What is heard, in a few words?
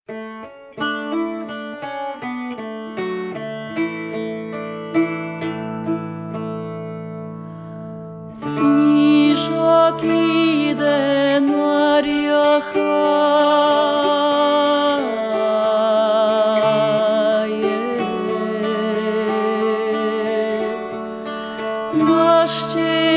Wedding song